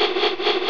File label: flap